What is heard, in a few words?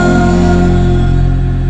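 monster voice; E-MU Formula 4000 Series Vol. 2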